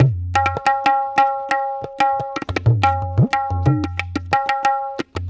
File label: Tabla; Sonic Foundry (Sony Creative Software); Ambient Grooves